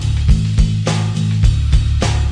103.5 alterno ballad w bass, drum loops and breaks; 101 to 110 bpm